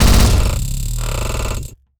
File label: weapon machine gun main, Assault Mech Robotic War Unit; Bluezone